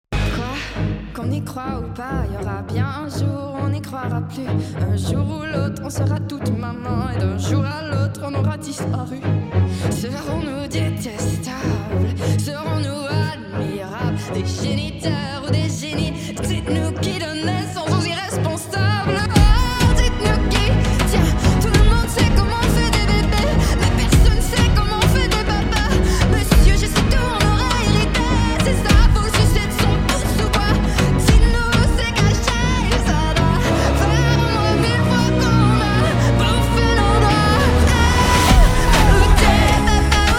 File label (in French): Chant